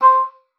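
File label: oboe d'amore C5; Xsample VOL-7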